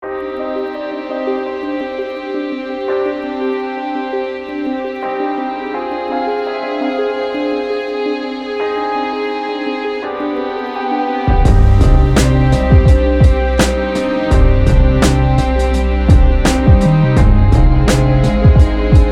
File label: Afro beat